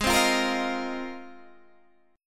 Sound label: G sharp 6th Add 9th, Guitar, Open D; down; chord shape